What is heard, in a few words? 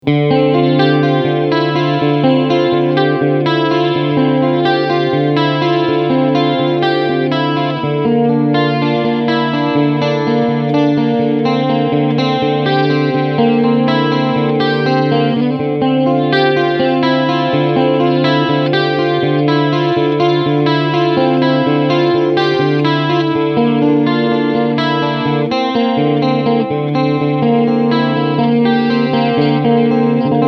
Jazz Chorus Emulation